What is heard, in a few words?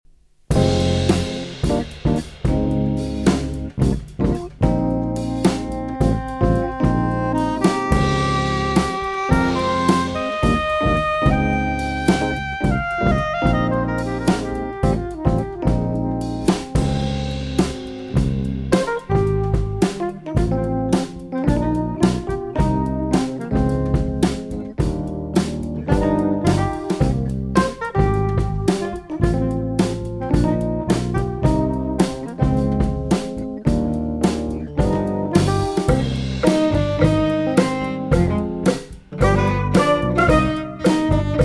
Funky Spring tune